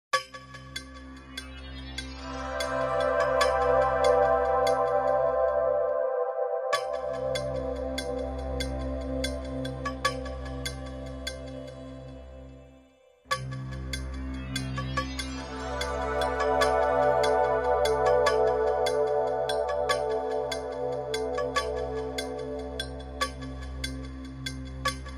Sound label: Low Energy Amazement Mystery